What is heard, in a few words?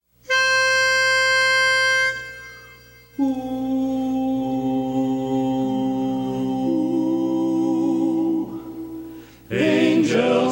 Tenor; Angels From the Realms Of Glory